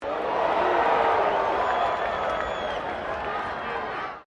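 crowd 15K Rugby World Cup cheer low, Rugby world cup game; Crowd Sound Effects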